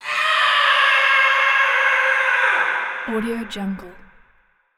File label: scream; human sounds